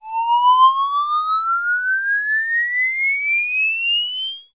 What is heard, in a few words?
cartoon ascend whine whistle; zapsplat